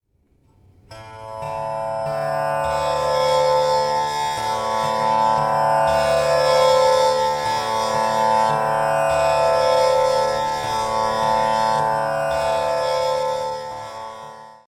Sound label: Tamboura D